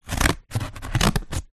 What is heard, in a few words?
office scissors cut card